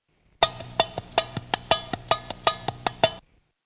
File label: tamborim